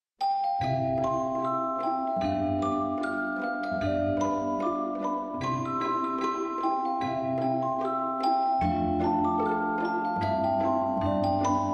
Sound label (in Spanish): flauta dulce; la flauta mágica coro de esclavos; karaoke play along